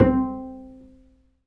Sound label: Bass, Roland